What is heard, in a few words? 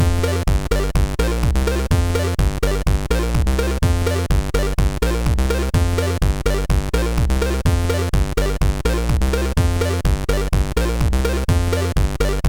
Race, sid, MOS6581R3